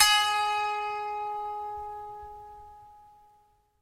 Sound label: koto; Summer Catchers